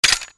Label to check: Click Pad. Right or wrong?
Wrong